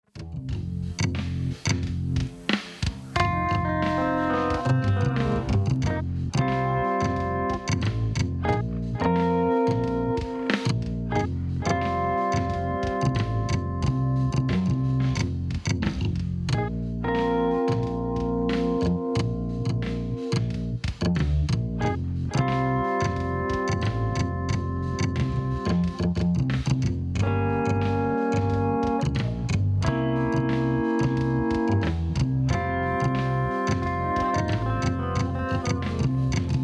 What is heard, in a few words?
Blues Example